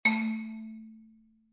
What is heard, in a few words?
tone